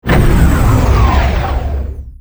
green alert

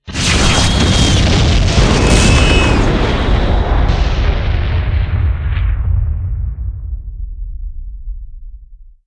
large, Explosion; NanoFXv2; ExplosionFX; ExpLarge

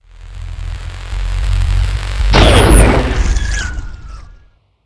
Enter Warp; NanoFXv2; WarpFX; Dominion

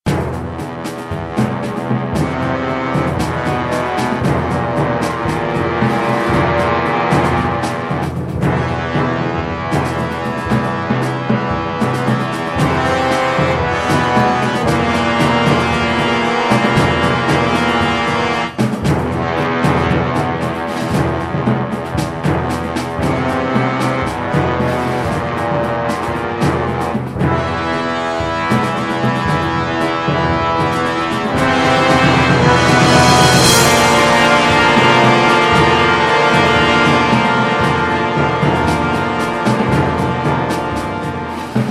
Combat Music; DS9FX

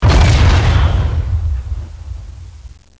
Collision, Explosion; NanoFXv2; ExplosionFX; ExpCollision

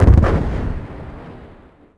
shield; NanoFXv2; ExplosionFX; ExpShield

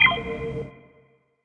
viewscreen off

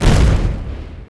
shield; NanoFXv2; ExplosionFX; ExpShield

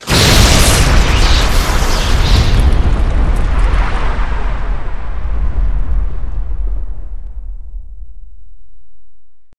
nova, Explosion; NanoFXv2; ExplosionFX; ExpNova

